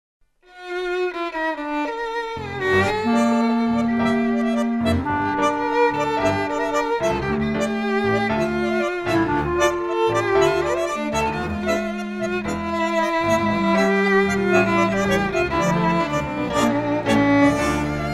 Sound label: clarinet, Dm